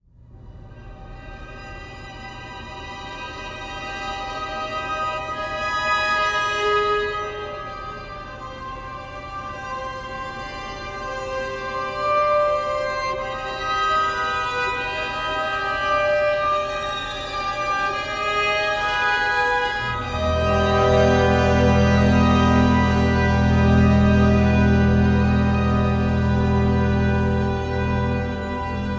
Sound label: Exterior; Outlast